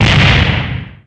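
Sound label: explosion small; Shadows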